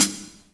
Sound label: klingy, Real Closed Hats